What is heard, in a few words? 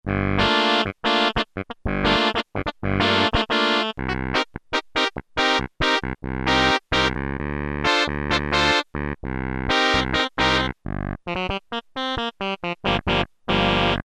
Filtered Clavi, Korg PolySix; Factory Presets Bank A